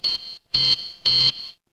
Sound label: ui beep